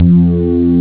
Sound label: Sweep, Amiga Soundtracker; ST-XX Amiga Soundtracker Sample Packs